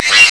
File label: beep